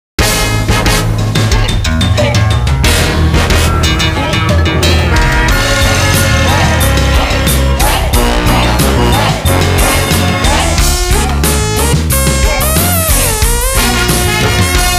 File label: Openning BGM; Taiko no Tatsujin 8 2006; PS2